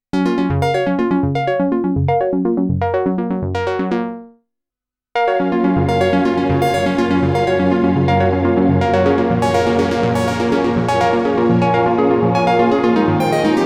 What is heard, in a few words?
Arpeggio Fifth; KORG Droplet